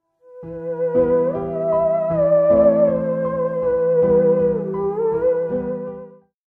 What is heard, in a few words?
Termenvox